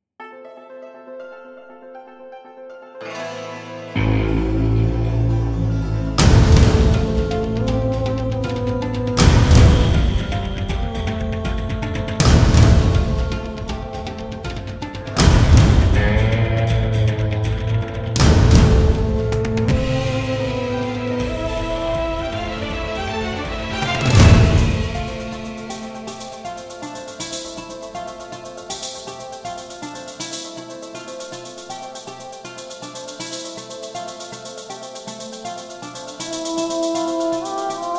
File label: Competition Intense